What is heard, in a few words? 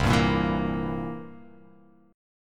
C 7th C down, C7; strummed; JGuitar